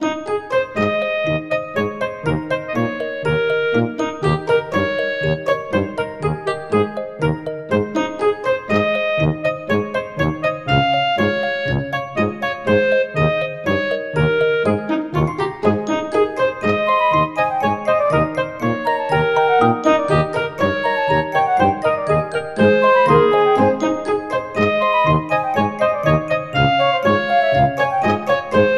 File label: Bike; peppa pig world adventures